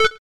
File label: countdown